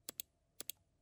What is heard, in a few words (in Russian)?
klik myshi zvuk